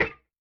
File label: ANVIL ISH; Yamaha DTXpress-II